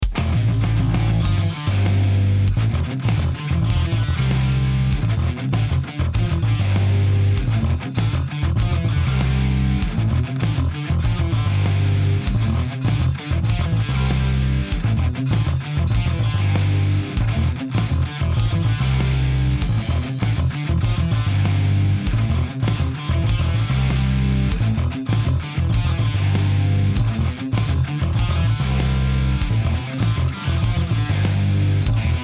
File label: riff